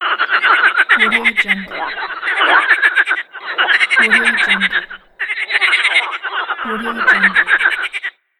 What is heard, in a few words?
frogs